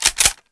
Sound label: famas boltpull, weapons; cstrike